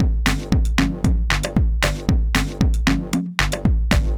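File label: drum, loops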